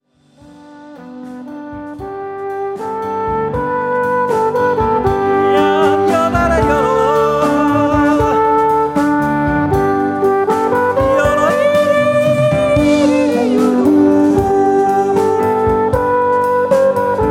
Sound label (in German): Choral; De Lozaerner; Choral fuer Luzern